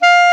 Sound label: KLARINET, Alpine Volksmusik; Best Service